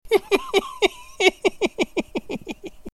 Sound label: hihihi